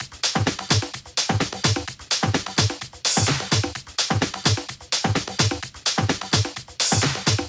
128BPM Latin Kit; Latin E Kit

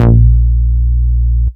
Root A#, Ultimate Bass; Vengeance Samples

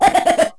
Vocal Oneshots; Vengeance Samples; Vocals

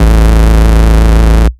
Bass Root A

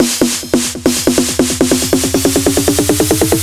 Fill 140 BPM

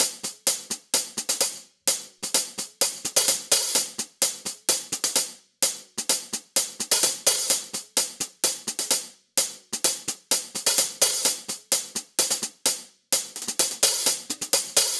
Real Hihats, 128BPM; Vengeance Samples; VEH2 Loops

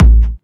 Bassdrums Clubby; Kicks; vengeance essential club sounds-3

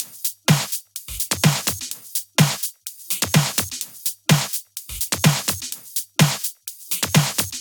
126BPM Kit; VFH1 126BPM Comeback Kit

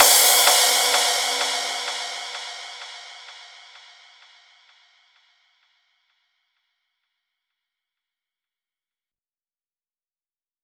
Crash Mix, 128 BPM; Vengeance Samples; Vocal-Hook Kits; Song Kit

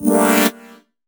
Synth Shot; Vengeance Samples

